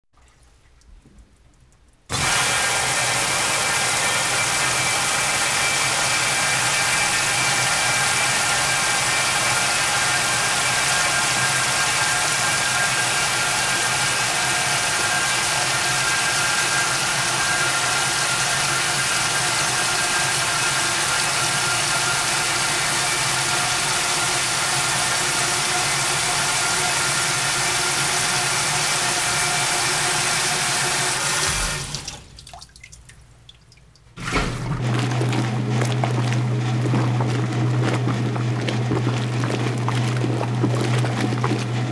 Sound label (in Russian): zvuk staroj stiralnoj mashiny